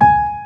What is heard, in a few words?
Piano; ltfat